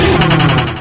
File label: spin up, spin trap; hl2